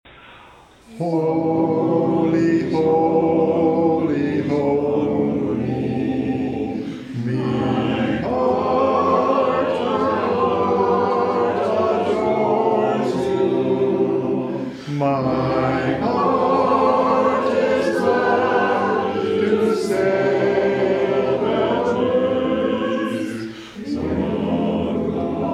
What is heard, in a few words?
Hymn; Santo (Holy)